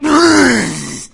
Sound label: zombie pain; doom3; fatzombie